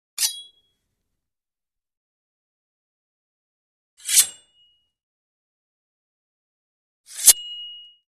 Sword Draw From Sheath Light Sword Drawn From Sheath; Hollywood Edge Foley Sound Library